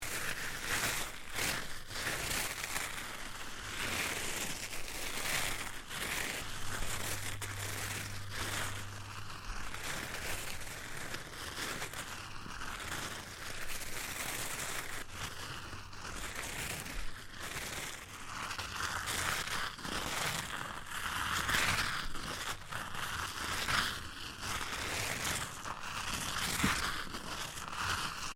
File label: created sled skis travelling over snow loop; Created sound of sled or skis travelling over snow; Skiing And Snowboarding Sound Effects